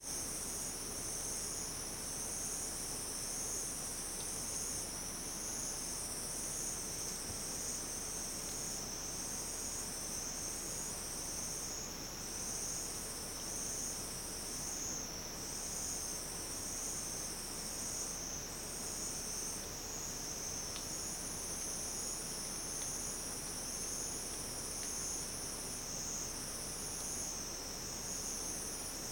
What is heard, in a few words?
Night Chichibu Forest Mono